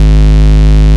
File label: Bass, Root G#; Vengeance Samples